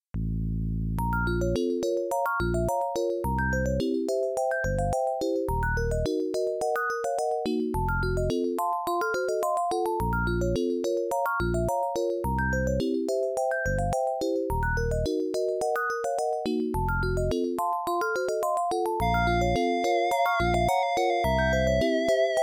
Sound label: Adlib; Frosty Land 03; Lollypop; MS-DOS